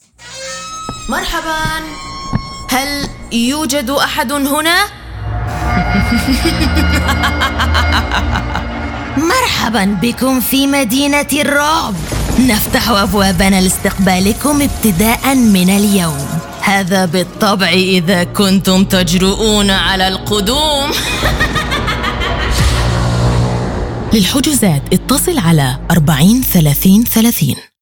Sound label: City of Horror; Announcer